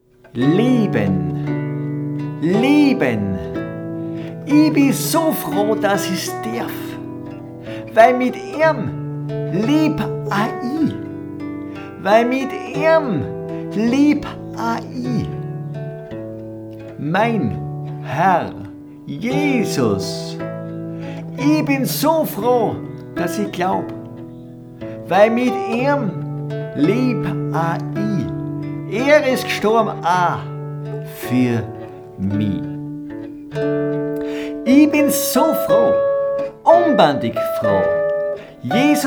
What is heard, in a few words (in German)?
Christliche Volksmusik Lied